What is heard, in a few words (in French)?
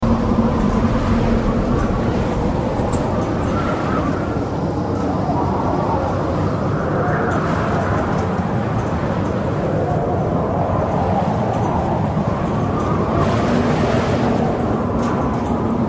Hurlements sinistres, Vents